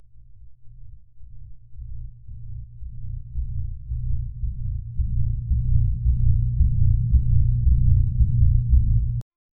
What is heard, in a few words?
electricity hum distant